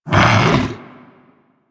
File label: Vocal Death, critter; Warframe; Hunting Critters; Undazoa